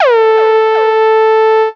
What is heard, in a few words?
lead syn, Techno Trance